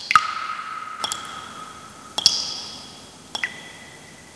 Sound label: subterranean waterdrop